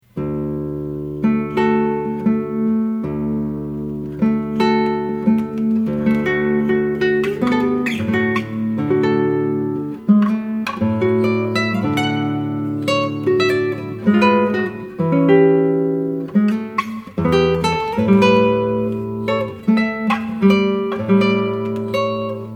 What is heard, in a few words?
tango